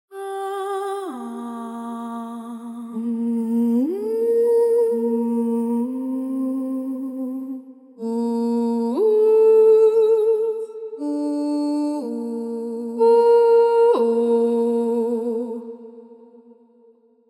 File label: Vocalise Intervals